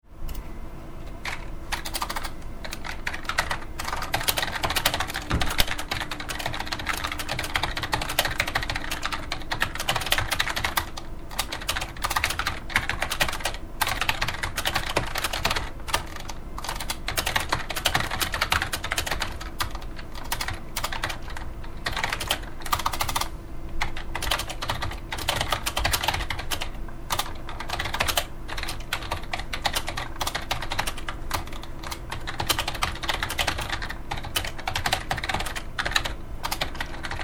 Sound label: Typing